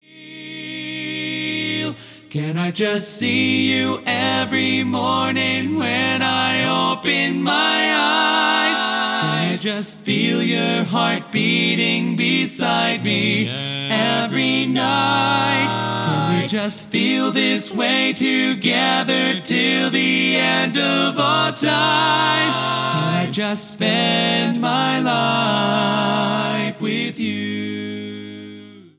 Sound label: Lead